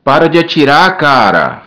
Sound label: berimbau